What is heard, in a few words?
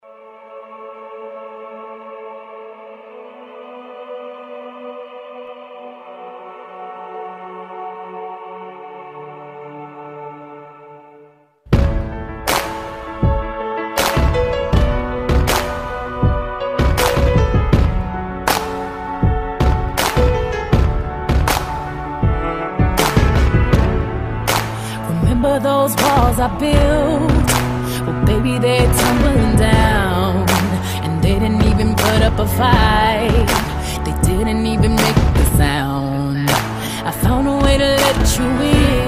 Ballroom Rumba